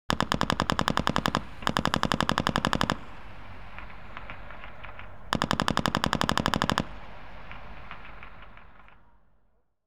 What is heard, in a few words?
Warfare, General Purpose Machine Gun; BBC Sound Effects Library; Explosions Guns Alarms